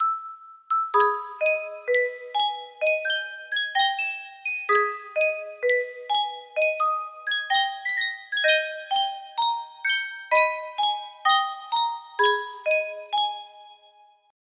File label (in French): sankyo boite a musique